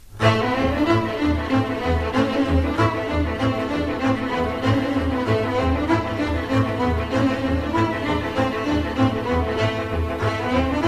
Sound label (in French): Sirba - Roumanie